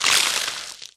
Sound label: scarab mob